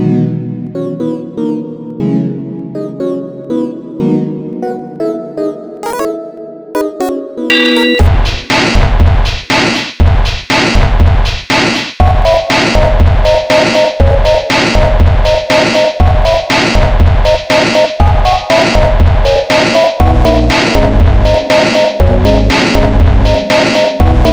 sinewave

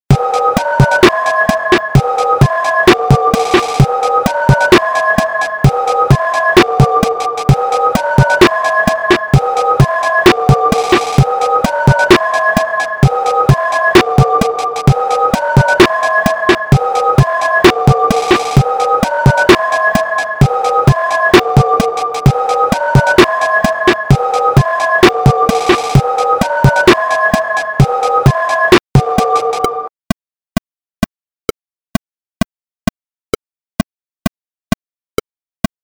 1k

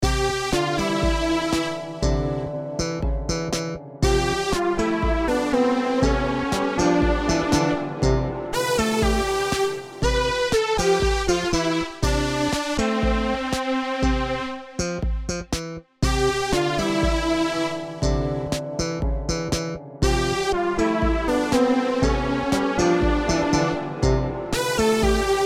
Midi

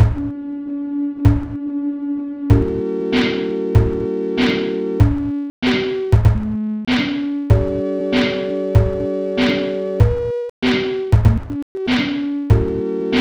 sine wave SONG

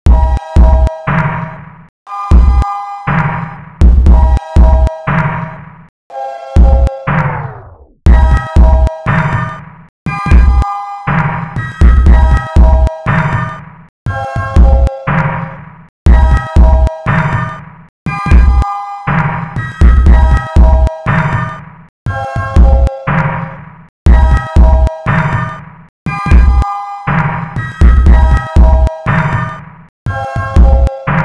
SINEWAVE PROJECT

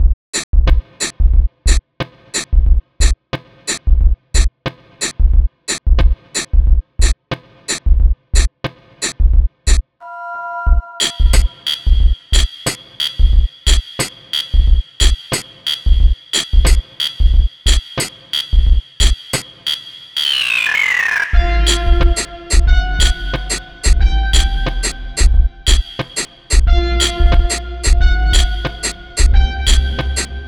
beat

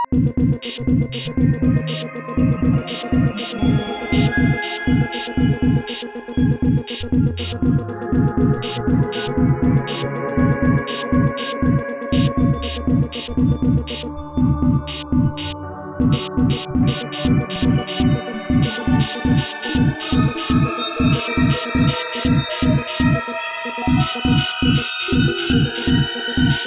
Sine Wave

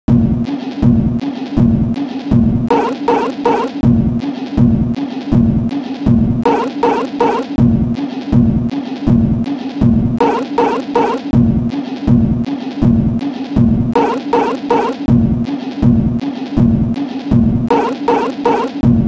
SINE WAVE SONG

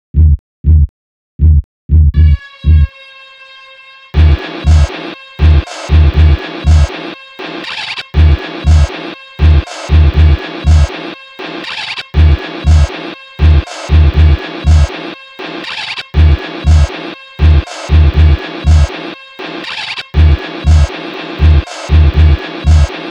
Sinewave

